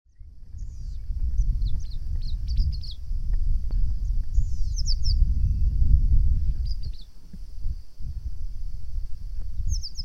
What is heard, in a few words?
Alopochelidon fucata Andorinha morena